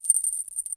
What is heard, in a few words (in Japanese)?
suzu